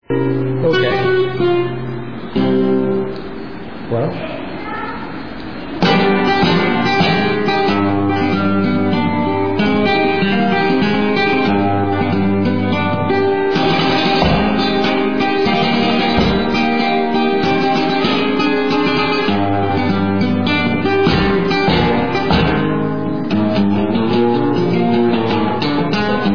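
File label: Solea Tempo, flamenco guitar; SEVILLA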